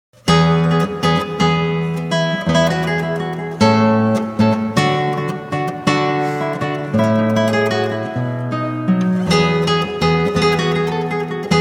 Saltarello, Lute; Casteliono Lute Book 1536; Pietro Paulo Borrono

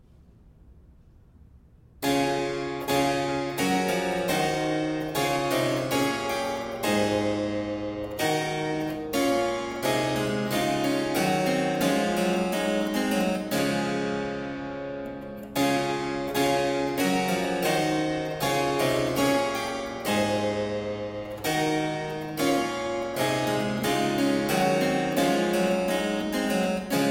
Chorale, Harpsichord; Gott der wohn uns bei; Johann Sebastian Bach

